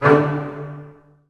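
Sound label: Bass Spiccato D2; Digital Sound Factory Virtuoso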